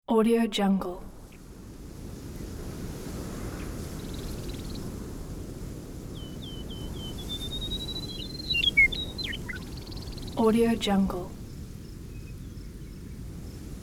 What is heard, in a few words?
wind